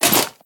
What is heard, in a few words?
armor legs; smite; god arthur